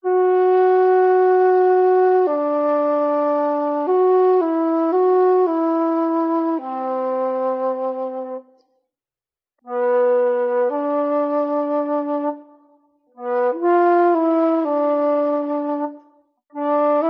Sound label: flute, Sad